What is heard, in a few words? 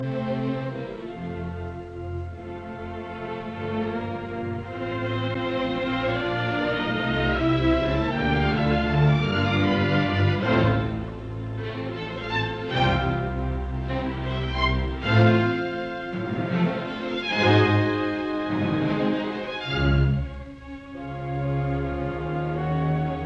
Symphony in F major Allegro con brio; Brahms; Op 90; Arturo Toscanini